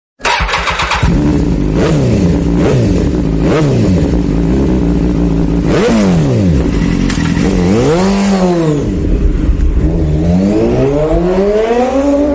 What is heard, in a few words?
Motorbike Ringtone